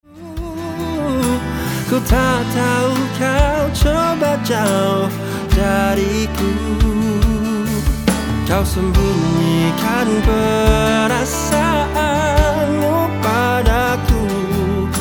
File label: rock alternatif